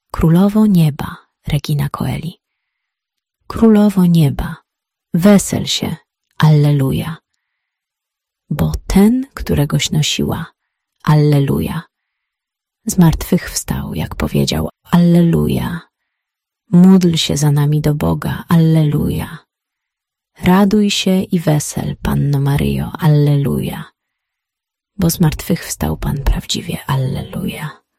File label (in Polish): Krolowo nieba Regina Coeli